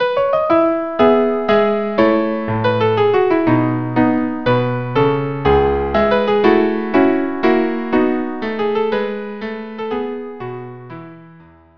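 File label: Minuet violin cello; Schubert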